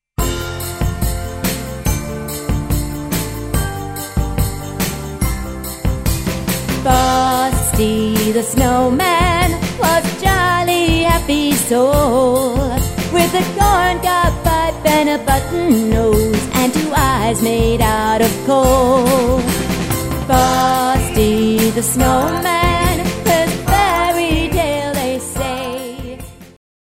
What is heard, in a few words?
vocal